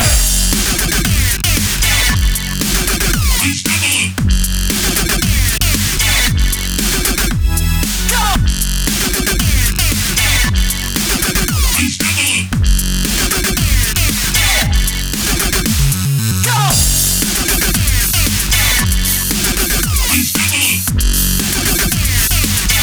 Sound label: drop; Intake